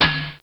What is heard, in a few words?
ship hit